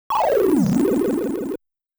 Boss - Flying; Gaplus

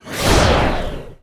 Enemy Underground Active Appear

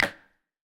Correct

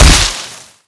Tree SFX KO; Skylanders Cloud Patrol; Arcade, iOS, Mobile

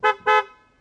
Ambience Car Horn

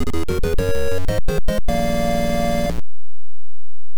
Perfect Bonus; Kirby's Adventure

